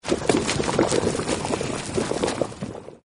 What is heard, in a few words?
sink; Legends of the Hidden Temple - Unlock the Past